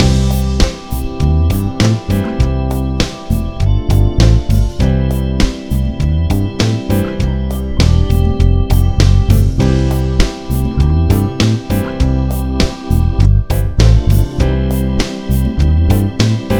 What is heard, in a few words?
Using the Major Pentatonic Scale Loop